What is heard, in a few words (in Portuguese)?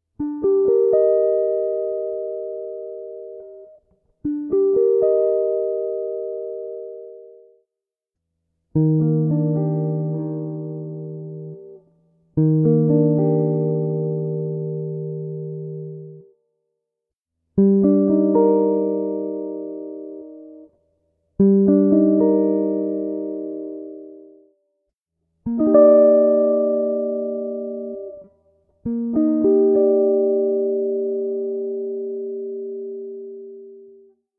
Inversões de Cmi9